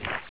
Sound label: clip in, weapons; charger7